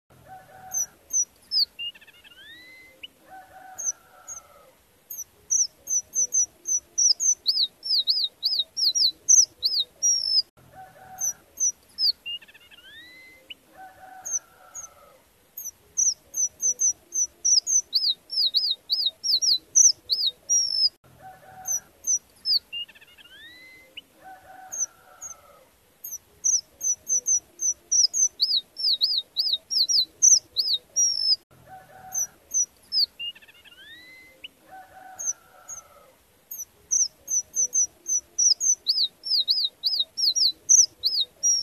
suara strawberry finch

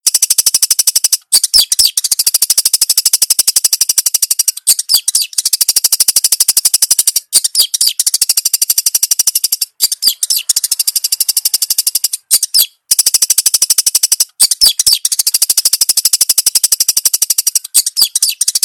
suara burung pentet gacor

